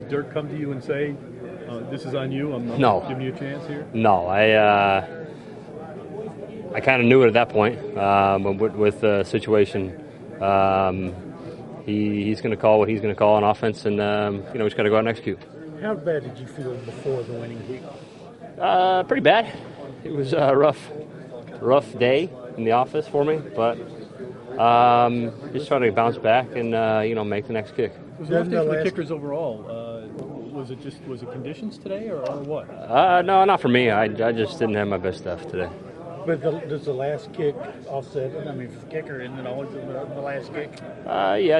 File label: Folk